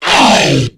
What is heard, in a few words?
stun; tera creatures; abomination guard